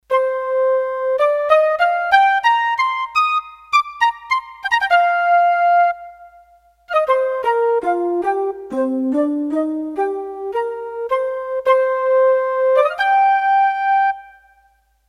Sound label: Concert Flute, Roland D-10; Factory Patches